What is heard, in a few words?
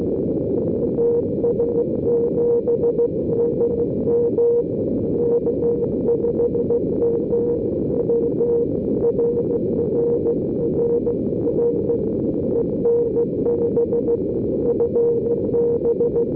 CW; Project Moonbounce